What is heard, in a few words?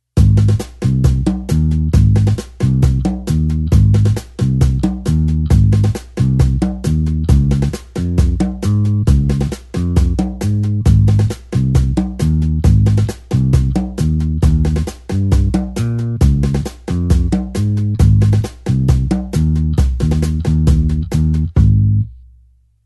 blues bassline 8beat Ab